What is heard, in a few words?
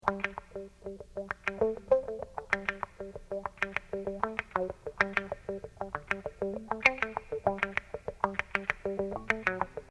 loop kicking mono